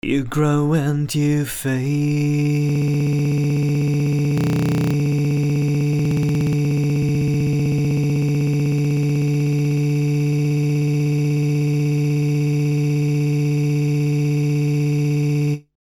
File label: Loop; Längenfindung